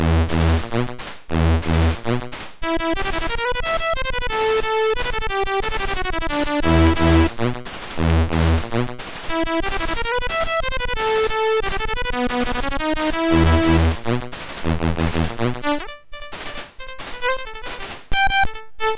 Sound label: Dub Mix MOS6581R3